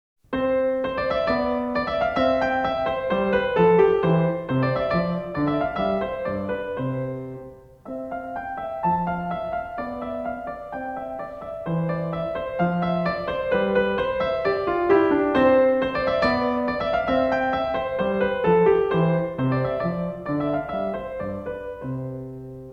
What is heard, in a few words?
Sonatina In C Major (Allegretto); Magrath Book 03